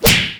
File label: slap hit; tf2